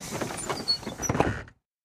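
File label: school bus door close; brakes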